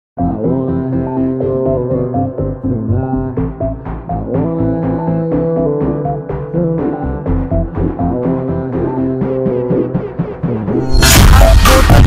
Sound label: slow reverb ringtone